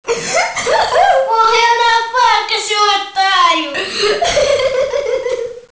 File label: knife